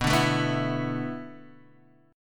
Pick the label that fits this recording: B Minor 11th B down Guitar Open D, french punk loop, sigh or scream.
B Minor 11th B down Guitar Open D